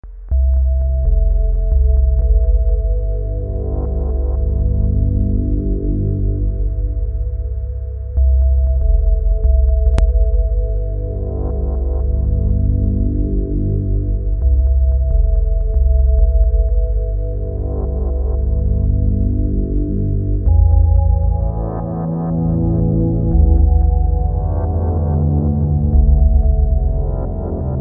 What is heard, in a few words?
Sitar Metal